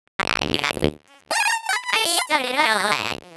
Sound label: Vox, Circuitbend; 99Sounds; Mangling Audio